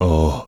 ahh

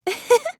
giggle; kaede

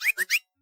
chocobo normal

